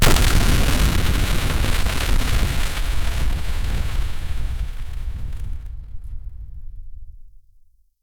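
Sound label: steam pipe rupture explosion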